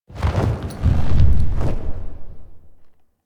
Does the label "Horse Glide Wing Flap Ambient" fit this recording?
Yes